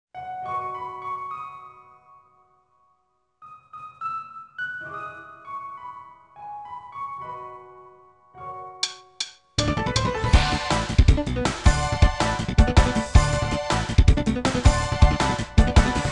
Start; Junclassic C.C. & Rope Club; PS1

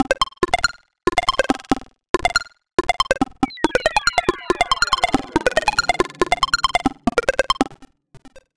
Speed Up; dark reflection

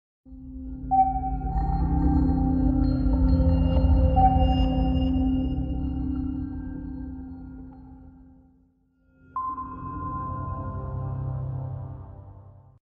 Logos Startup

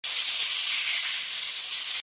Slide Loop

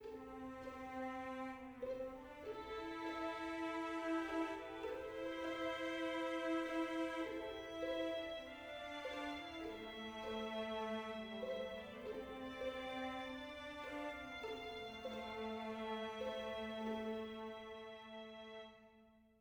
adventure soft

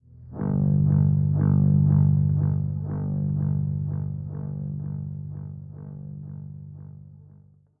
pulse sting; AirMech Command VR

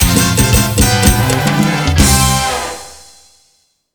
Fanfare; Samba de Amigo; Wii

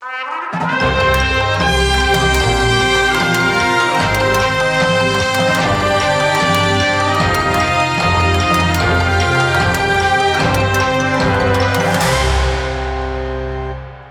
Game Over Dead; Demons Crystals

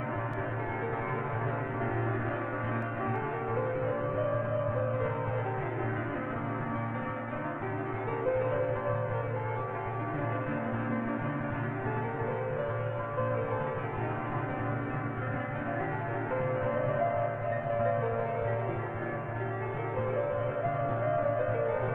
Piano Piece; gamerip; door to door

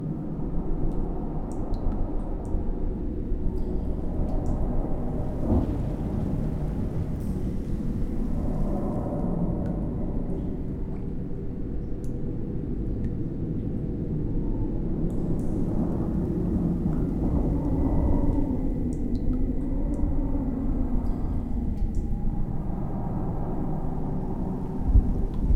Amb Basement Stormscape

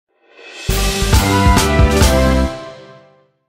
Minigame- Game Over; Grand Chase

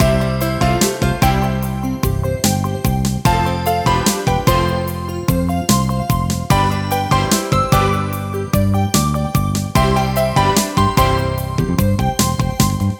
Map Screen LOOP; delicious

